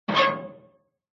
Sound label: impact metal huge heavy hit with scrape